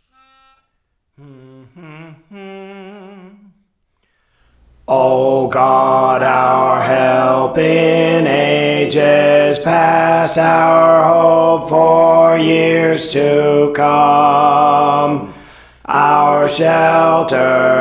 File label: Alto, Songs; Praise For The Lord Training; O God Our Help in Ages Past; St Anne